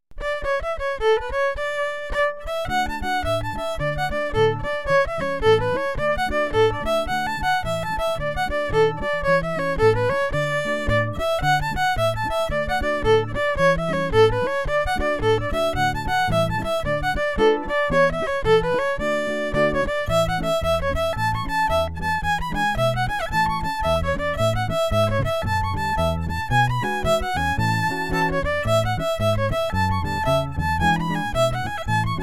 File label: Caledonia jig